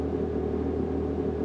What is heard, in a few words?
idle, vehicles; TDMCarpack; TDMCars